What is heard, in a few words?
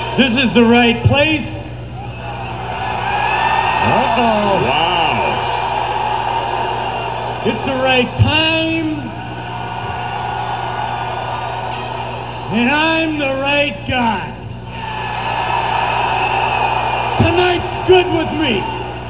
sting